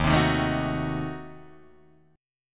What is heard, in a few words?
Eb Diminished 7th Eb, Guitar Drop D